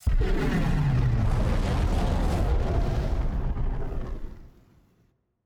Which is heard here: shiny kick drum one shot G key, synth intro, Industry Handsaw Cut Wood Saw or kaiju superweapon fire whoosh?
kaiju superweapon fire whoosh